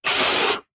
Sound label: biter roar; factorio; Factorio 0.14.21; creatures